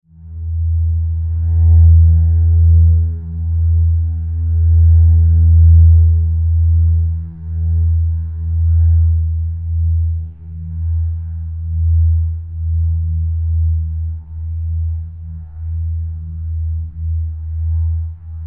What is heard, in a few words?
Drone Feedback Low Synth Swell; Blastwave